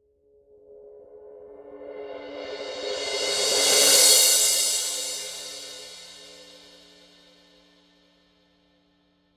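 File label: signature 16 reflector full crash roll, cymbales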